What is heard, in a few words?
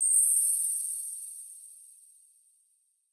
fairy dust; soundscrate